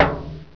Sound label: TIN HIT